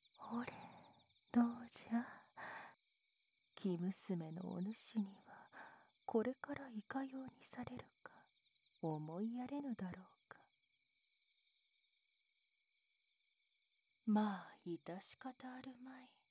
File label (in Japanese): 耳舐め, ASMR; 「耳舐り」